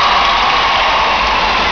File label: CROWD